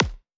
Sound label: future bass kick high pass